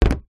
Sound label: foley brick piece small set down on plastic